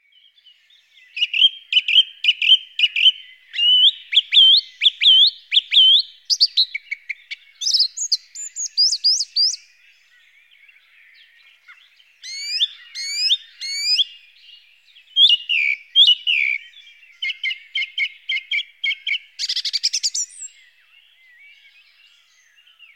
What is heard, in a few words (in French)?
Grive musicienne, chant